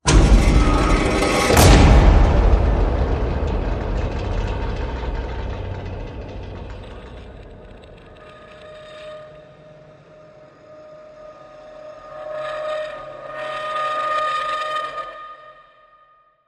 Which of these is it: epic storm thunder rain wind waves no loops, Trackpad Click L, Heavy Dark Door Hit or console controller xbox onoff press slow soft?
Heavy Dark Door Hit